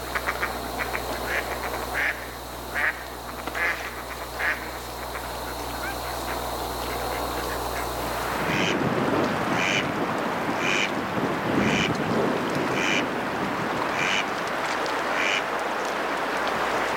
colvert

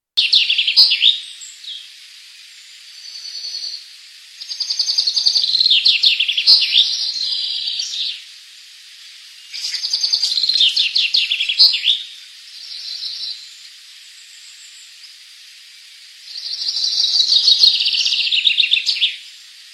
pinson

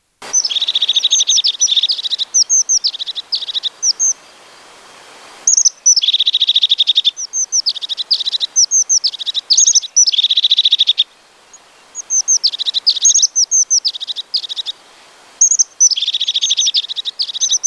mesange huppee; Le quartier de la Torse héberge une faune importante et notamment une foultitude d’oiseaux

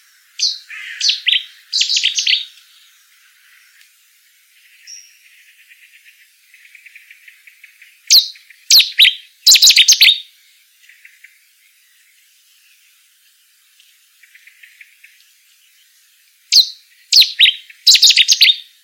bouscarde

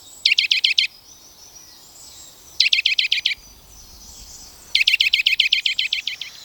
sitelle

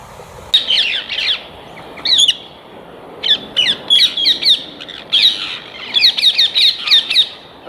perruche

